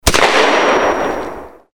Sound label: 12 gauge shotgun blast